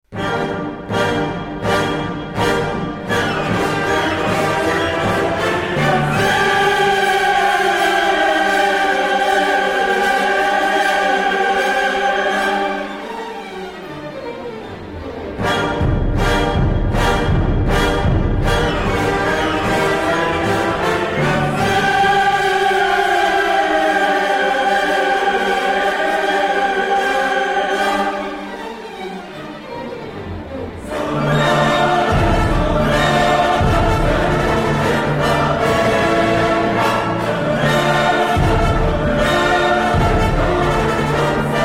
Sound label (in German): Klassik Chor